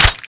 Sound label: clip in; tfa cso; k3